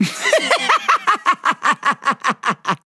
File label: Mad Scientist Laugh; Paladins